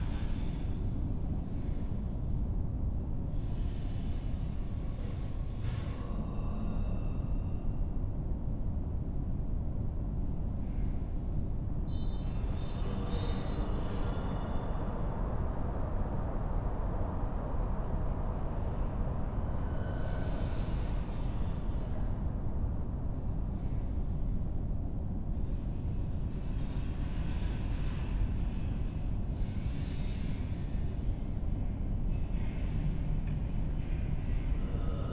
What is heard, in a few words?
atmos slab